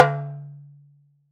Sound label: Darbuka